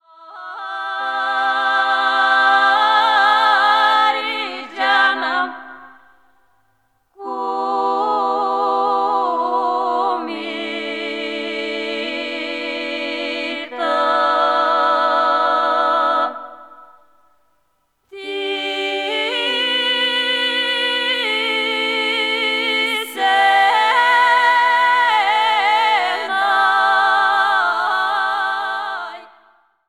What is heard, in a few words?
female choir